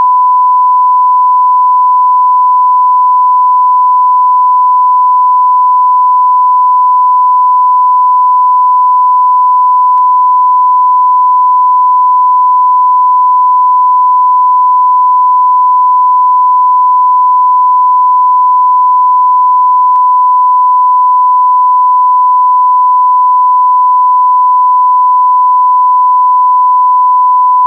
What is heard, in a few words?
Sine 1kHz, TV test pattern with sine tone 1kHz; tv monoscope